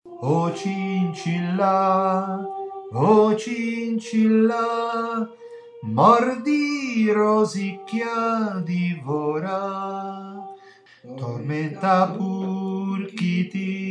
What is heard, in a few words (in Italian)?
operetta alti